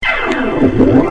gliss down up, organs

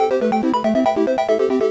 playful dots computer arpeggio

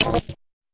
fx drum

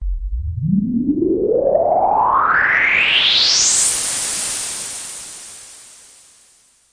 Super Bass Station, noise sweeps

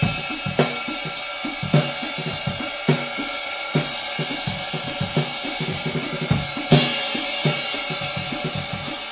vintage live rides n drums, 105 bpm, drum loop; avedis

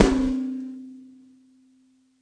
ringing trick snare; single hits

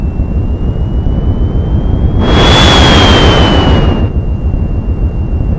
warp, video games; tacscan

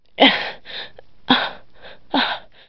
breaths, Silly Lo-Fi Orgasms

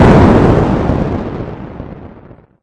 explode, video games; starcas

